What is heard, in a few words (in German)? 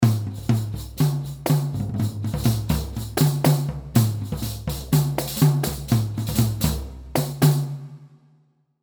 trommel karneval